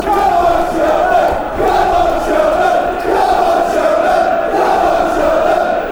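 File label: come cheltenham, football chants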